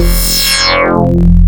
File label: Complex Dubstep Organ; Ableton Live 12 Lite; Vector; Sprites